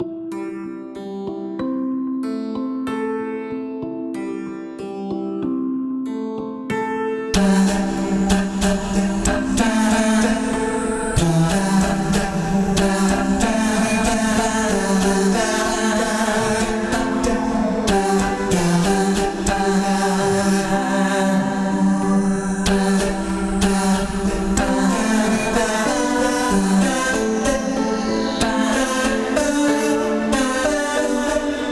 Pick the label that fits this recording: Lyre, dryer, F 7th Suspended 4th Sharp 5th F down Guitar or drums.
Lyre